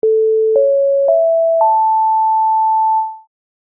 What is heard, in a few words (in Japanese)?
お知らせ 始まり 遅め; お知らせ・始まり・その１・遅め; 開演ブザー; 演劇・舞台